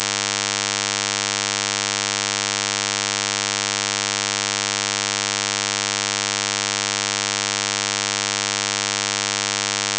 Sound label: pulsetrain; Sprachkompression/Vocoder